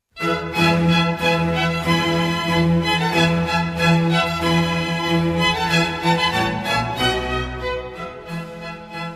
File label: orchestra